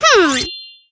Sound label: Caramel Out Sound; Jolly Battle; Android